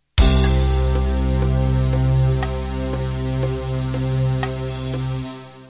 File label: Fusion End